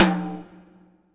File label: R TOM HI, Drum Mashines; EdgeSounds; Drum Mashines VOL-1; R-100 DRUMS